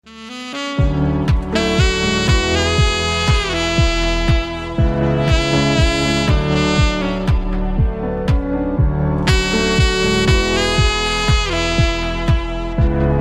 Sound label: saxophone cover